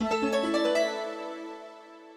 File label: Creature Rescue